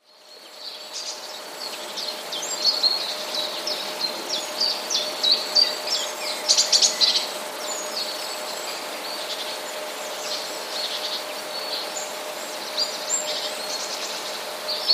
pierwiosnek, październikowe nagranie; Śpiew pierwiosnka można jeszcze usłyszeć w jesienne, ciepłe dni

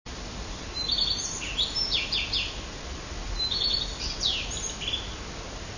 pleszka - nieco inne motywy; wroblowe; turdidae

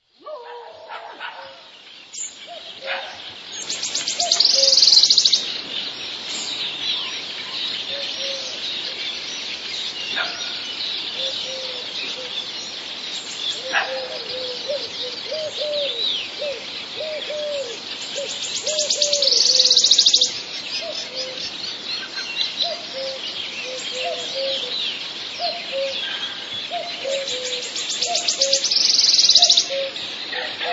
swistunka

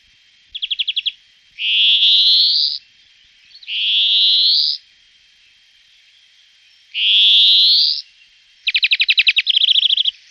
dzwoniec - śpiew i gwizd

